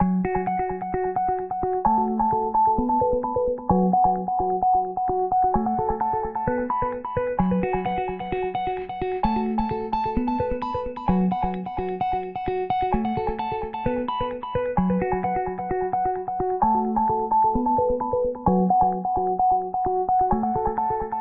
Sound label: game music